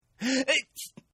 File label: Sneeze; Sneezing; Human; Partners In Rhyme